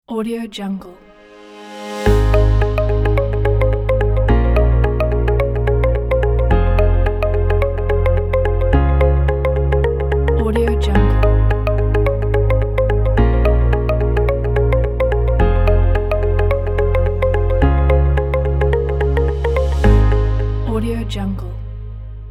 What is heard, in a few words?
soft modern corporate logo